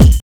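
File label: bass drum; E-Lab; X Static Goldmine 1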